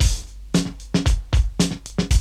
BREAK; Zero G - Man Machine

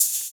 Ride; Equipped Music; Loungin House

